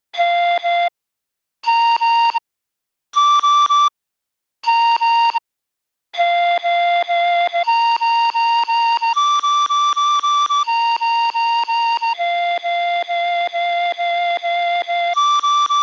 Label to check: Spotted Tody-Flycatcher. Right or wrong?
Wrong